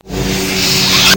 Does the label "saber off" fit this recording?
Yes